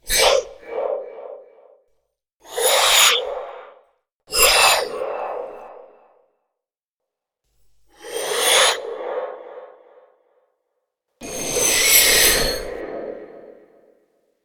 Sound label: Sucking Vacuum Whooshes